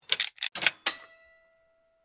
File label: Steampunk Gear Lever, Scifi, Steampunk, Gear, Lever; Science, Fiction; BlastWave